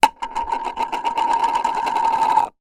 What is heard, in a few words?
foley plastic hollow disc small down on table spin; zapsplat